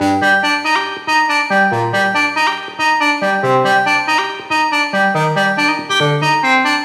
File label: Riddim Synth Loop G (140); Ghosthack; Riddim Essentials 2020